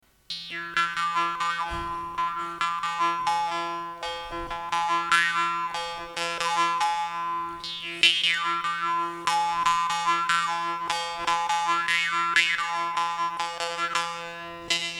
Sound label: echo